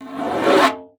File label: STREI FG, BONGOS; Xsample VOL-9